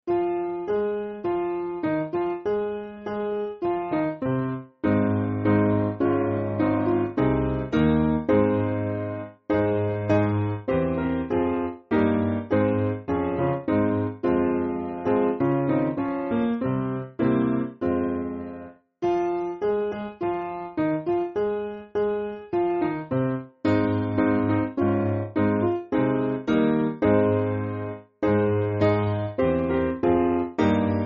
Piano, church music; Come Ye Sinners; Restoration

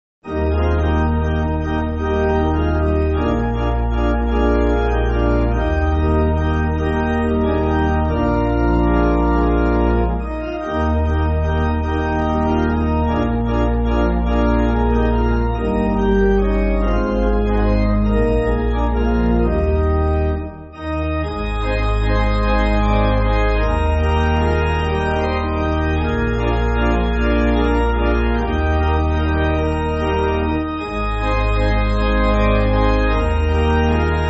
Pipe Organ, Backing Files for Churches; The Song Of Heaven; Murray; J.R. Murray

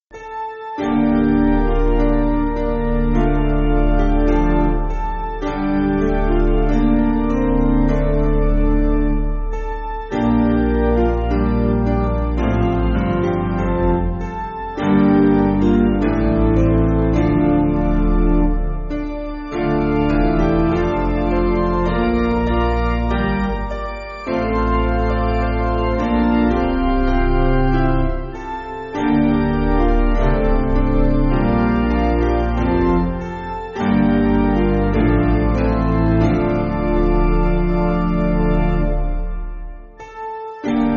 OPiano; Ah Dearest Lord; Faber

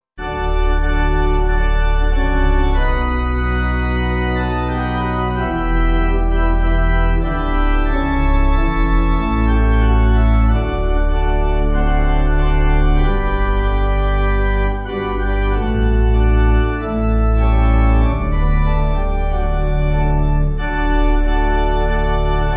When I Feel The Touch, Pipe

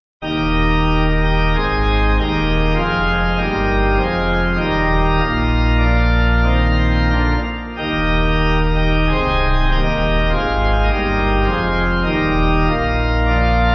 Pipe

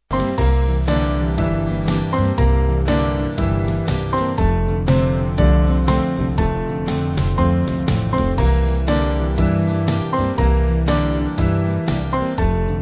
band

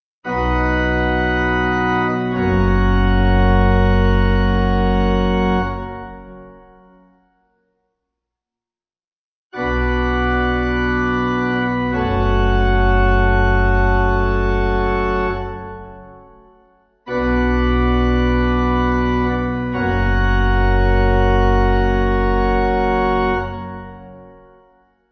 Amens Simple Gb Pipe

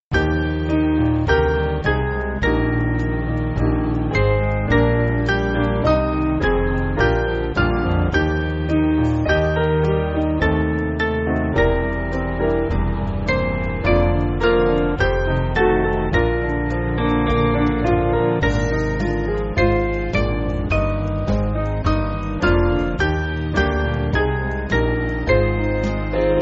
Piano, church music; Strength And Stay

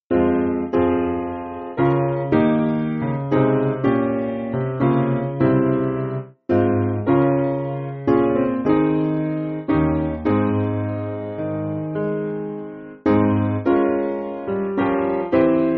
Lord When We Bend Piano; Hunnys

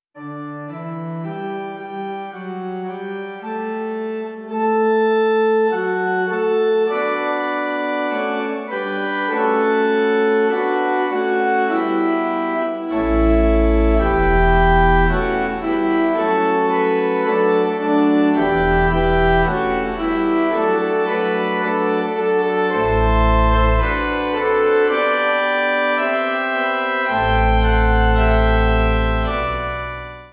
Gloria In Excelsis Pipe

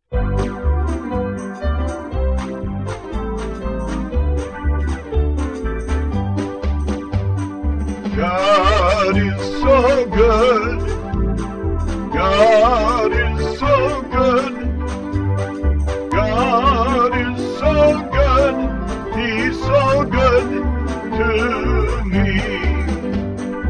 Jazzy Vocals Band

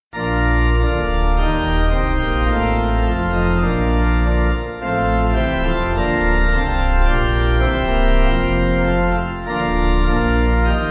Who Are These Like, All Saints, Pipe

